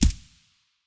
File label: sig550 silencer far; weapons